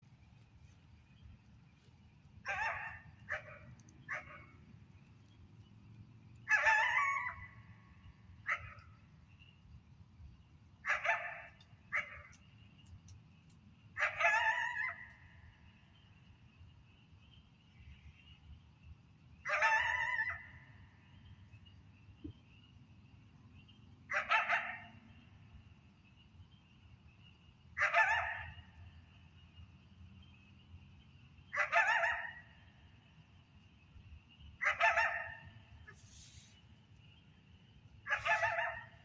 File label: coyote vocalizations